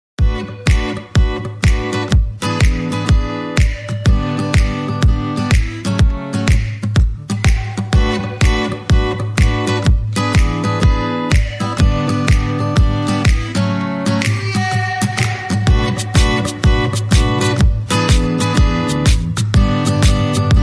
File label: Guitar Play